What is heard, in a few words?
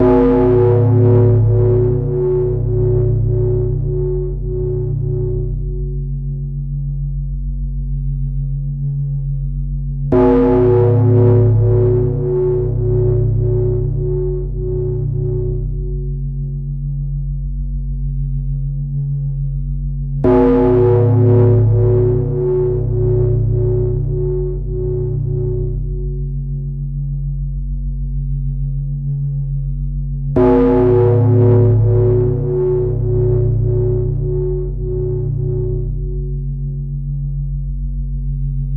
Bells lp dist